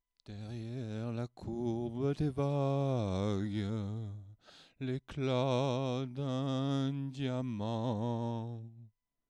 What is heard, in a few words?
Male Basic